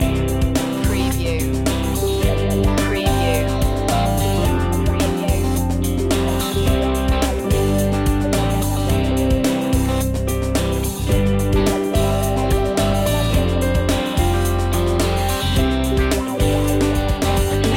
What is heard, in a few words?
happy girl loop